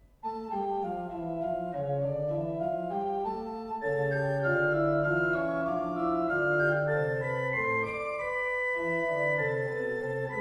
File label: Magnificat fuga, orgel; Pesse; Drenthe; JohannPachelbel